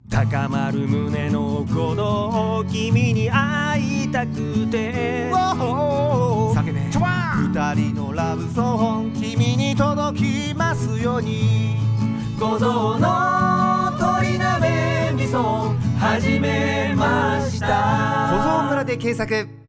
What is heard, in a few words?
CM SONG